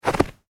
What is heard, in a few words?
human footstep snow; Single Footstep On Snow; Single Footstep On Snow 6; McKinney Sound